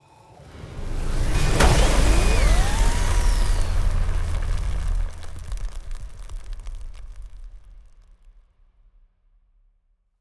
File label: ship transport ship move; Stellaris; toxoids; ships